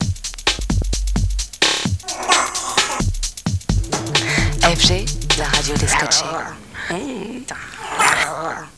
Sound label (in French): jingle; FG98.2; Fréquence Gaie was the first and exclusively gay radio station in the world